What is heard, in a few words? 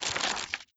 book open